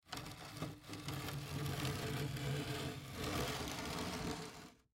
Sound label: Milk Crate Slide Movement Plastic; Food Sound Effects; Household; Foley